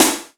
SD, Yamaha RY-10, Drums

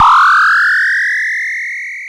BOSS PERC SNTH, AX-60; Rubber Chicken Software Sample Bank

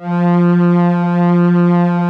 JX8P STR F2, SYN STR

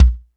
JAZZ K, JAZZ KITS; Crypton; DrumBase5000

